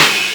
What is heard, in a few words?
CYM NOISE; USB Soundscan vol.27; Ragga & Dub Reggae; AKAI; CYMBALS K